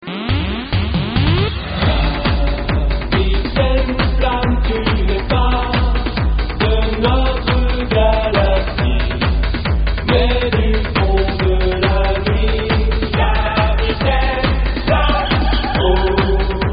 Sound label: techno